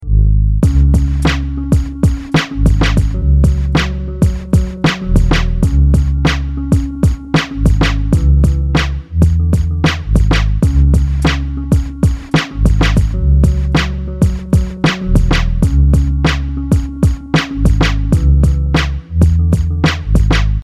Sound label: hiphop